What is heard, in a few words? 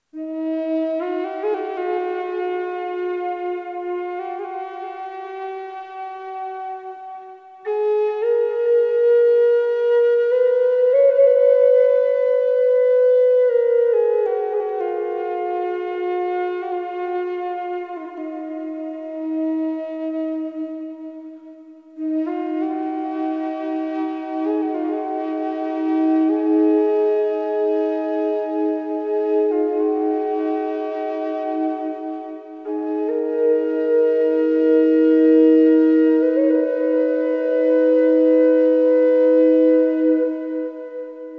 Aeolian E 432 Hz drone flute; aeolian 7 loch; nussbaum pappel